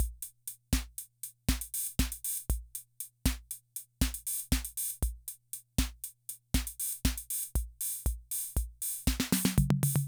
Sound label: Loop, rhythm 55, classic machines